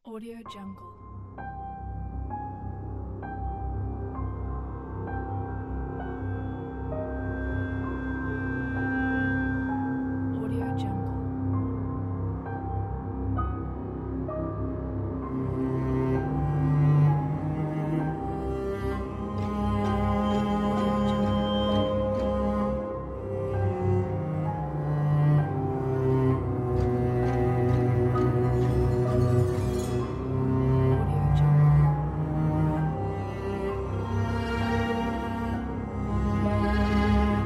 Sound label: creepy piano